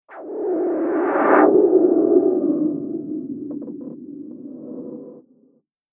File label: Magic Surreal Soundscape; Andrew Fleming